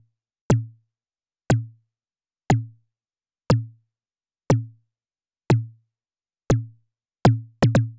Anabeat Snare; Sonic Foundry (Sony Creative Software); Prototechno

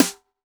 TR-909 Snare, Roland BK-5; Snares

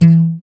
GUI AC, GUI Acoust. Slap; Sound & Vision; Gigapack I CD 2; GUI ACOUSTIC 2

